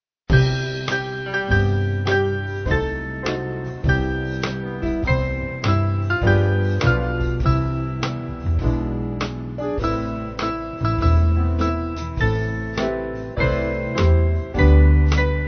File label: Band, Music Backing Files for Churches